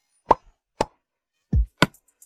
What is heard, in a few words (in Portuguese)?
toque